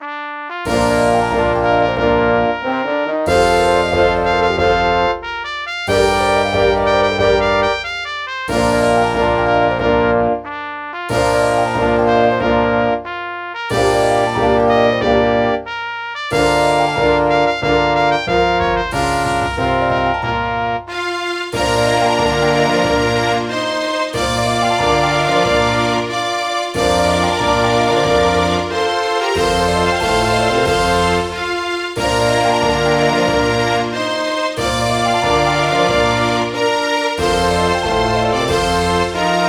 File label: ANTHEM Somalia; africa